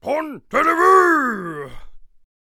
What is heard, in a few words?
King Attack Vocals